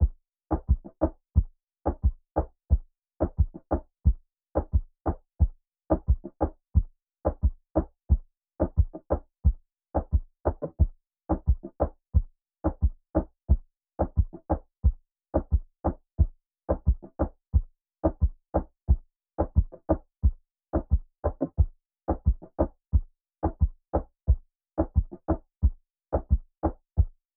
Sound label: 89 BPM D Min Percussion, Latin; Melodies; Loop Stems; Blanco